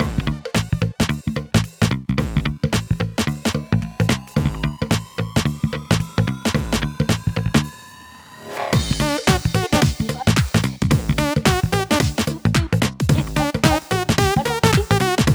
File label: main loop